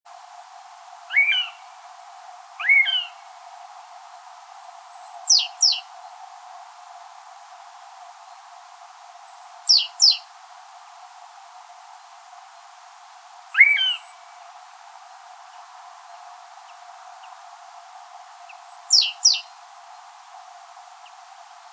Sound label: Garrulus glandarius; SETE